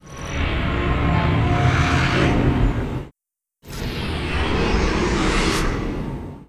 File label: Standard Shield